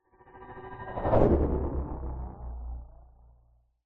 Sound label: science fiction hovering vehicle pass by fast